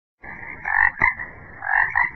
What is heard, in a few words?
croaking frog short; animals